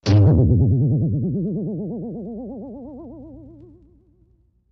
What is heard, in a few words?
Bing, metallic, insecty, vibrato up; bings